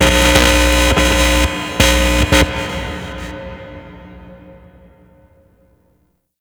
Stage Amp; Warframe; Ambience; Nineteen Ninety Nine; Obj Temple Defense

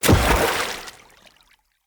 Blunt Heavy Impact Water, Weapon Impacts; Player; Environment

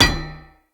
Unarmed Punch Impact Metal Shield; Weapon Impacts; Player; Combat

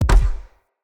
Bow Shot Punch; Weapons; Player; Josalfar Bow

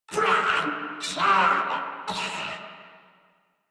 Death Crewmen; Dialog; Barks; Battle; Warframe; Corpus Crewmen; Crewman D

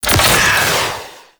Space Fighter Dredger Weapon Fire; Grineer

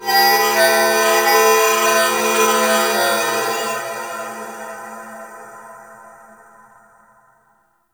Temple Moving Bells Two, Corpus Ship ambience; Temple One

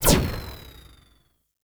Turret Tether Loop Stop; Warframe; Missions; Zariman; Zariman Turrets

